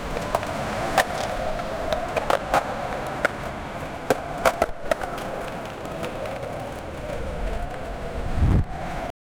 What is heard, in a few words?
skateboarding